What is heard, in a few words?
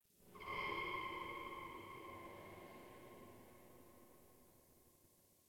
Metal Groan, Metal Groans; Warframe; Locker Room